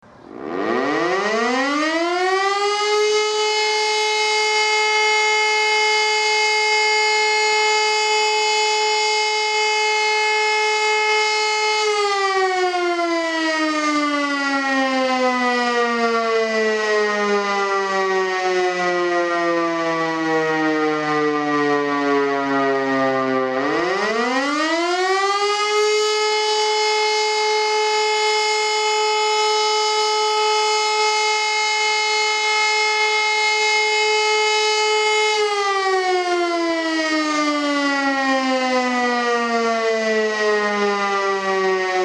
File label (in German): Sirene, Feuerwehr